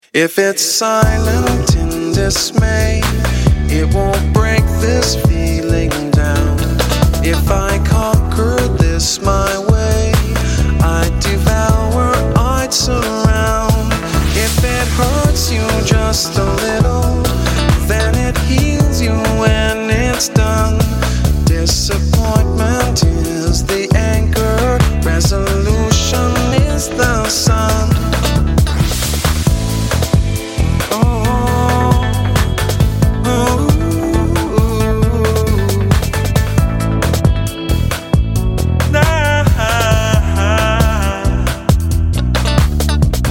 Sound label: pop pulse